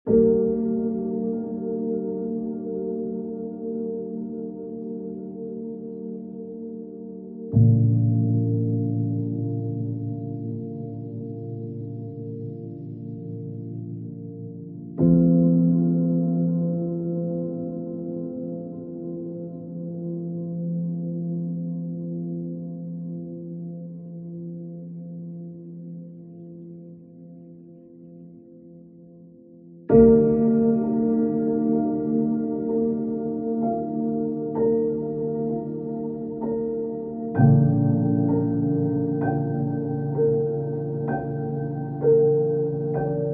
Some Soothing Piano

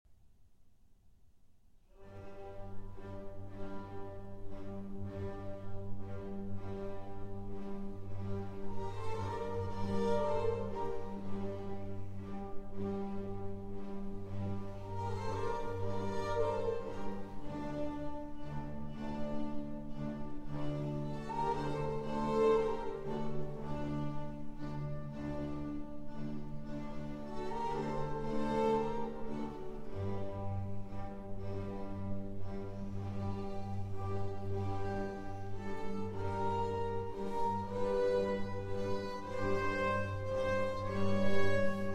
Nisi Dominus, Arr. Cello

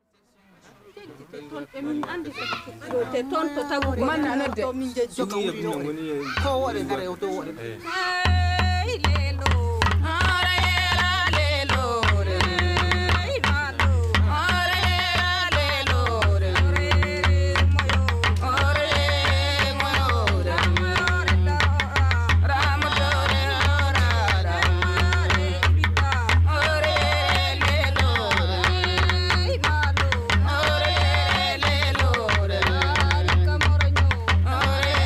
Wodaabe Women - Drumming and Singing the Baggou

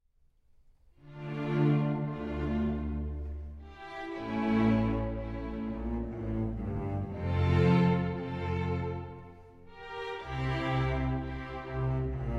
Suite in D Minor Sarabande

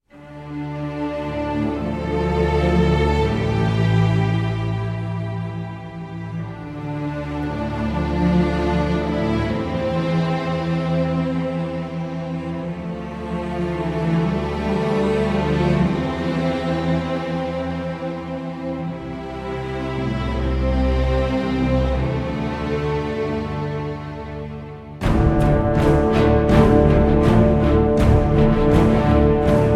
Instrumental

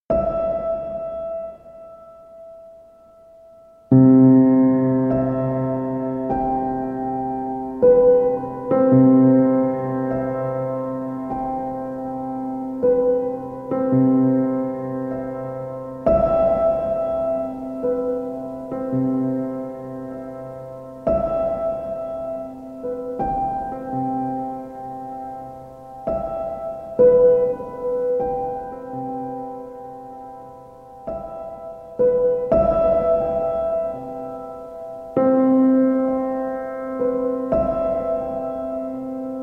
Piano Sounds

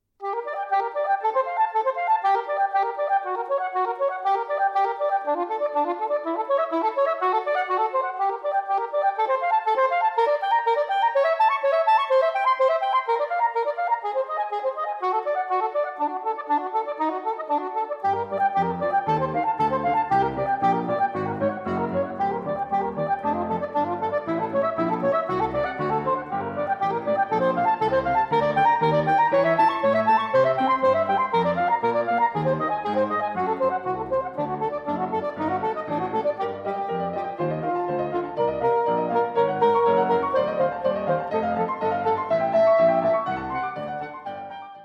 Sonata For Violin and Piano (Arr. For Saxophone); Movement III

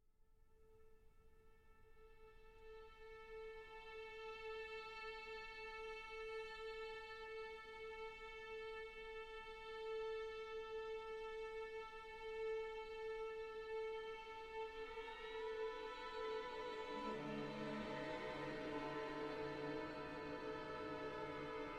Symphony Orchestra; I. Sostenuto

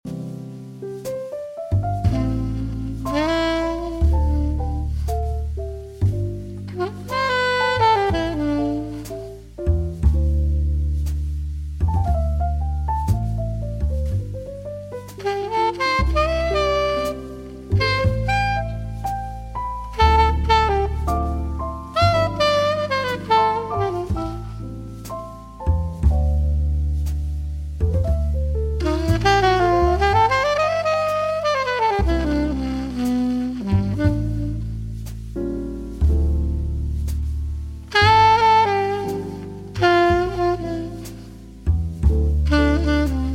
Hotel Lobby Jazz

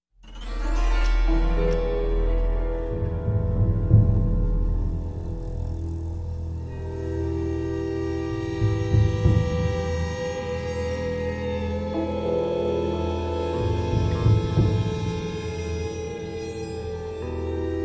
Flooded Suspense

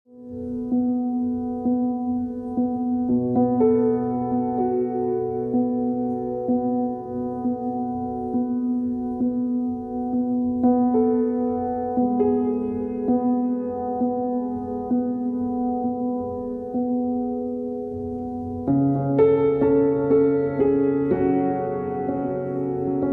Piano Theme

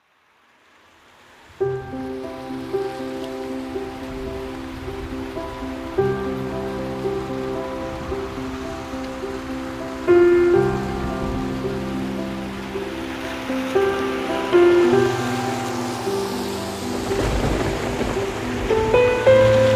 Piano and Rain

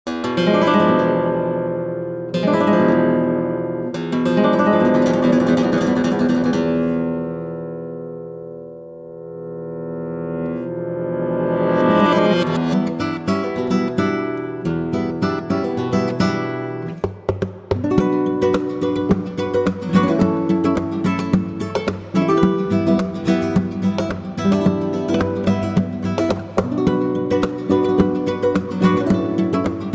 Guitar + Pipe

